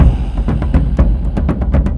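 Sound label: Music Shield; from dust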